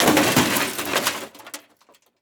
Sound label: Crash DVD Player Tumbling On Pavement; Crashes